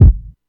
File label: kick drum g sharp key